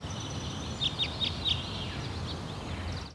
bird loop part

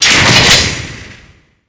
jaildoor unlock; gulag; Call of Duty Modern Warfare

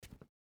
footstep rubber boots concrete jump start; Rust

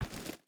footstep rubber boots gravel walk; Rust

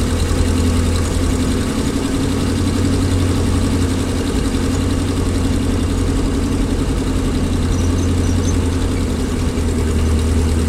m5a engine rpm 33 load; engines; War Thunder

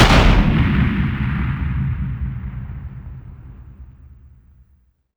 turret cannon explosion far

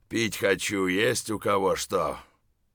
dehydrated; Escape from Tarkov

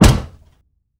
Aircrafts Engines Packard Merlin Prop; DCS

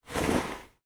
Inf Weapons Foley; Red Orchestra Ostfront 41-45

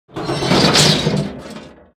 objects, World of Tanks

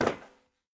walk proflist; Escape from Tarkov

